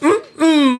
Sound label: mmm mmm; ooh ahh